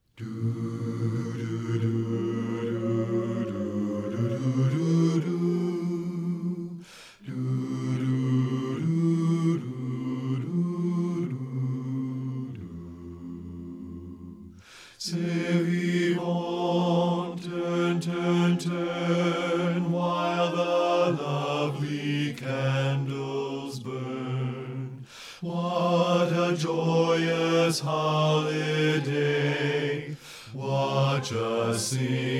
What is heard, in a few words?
BASS; satb